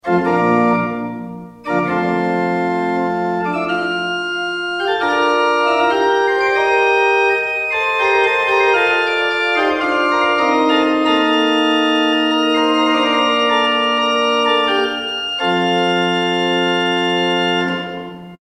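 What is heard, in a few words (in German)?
Coppel 8 Flöte 4 Oktave 2, Orgel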